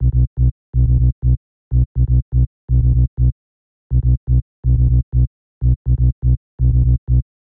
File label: tech house bass samples B 123; loops